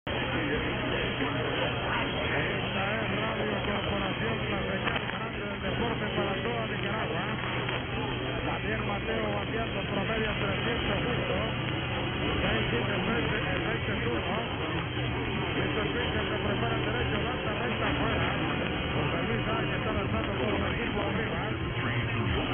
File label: Radio Corporacion; YNA3OW